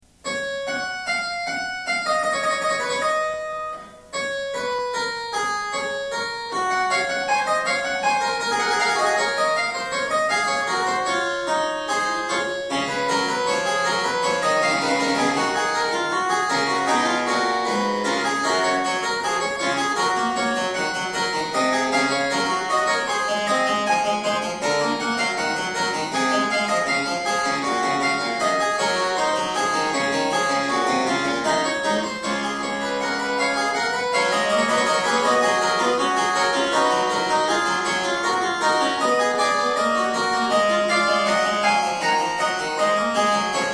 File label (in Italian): fuga; bach; bwv858